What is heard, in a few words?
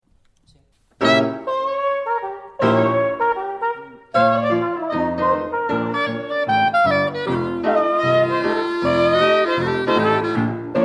Buffalo Blues